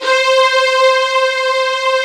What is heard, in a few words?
ANA STRG, 01W BAROQ; East West; Global Sounds